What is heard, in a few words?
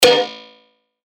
percussion; Pyre; revealed percussion vol 2